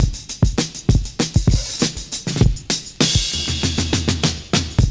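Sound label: JAZZY F, 100 bpm; Univers Sons - Kitch 70s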